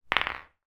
sport snooker pool ball into pocket onto other balls